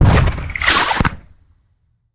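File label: Cannon Cannonball; Sound Ideas; Guns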